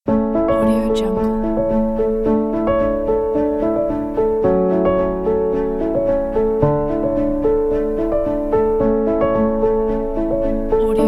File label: a soft inspiration